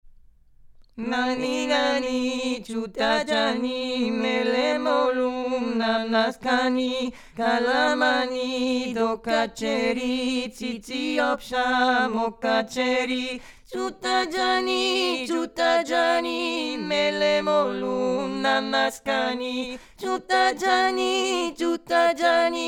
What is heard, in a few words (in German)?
3stimmig